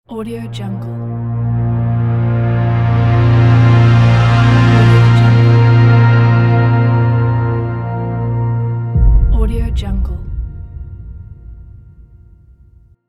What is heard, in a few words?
gentle orchestral logo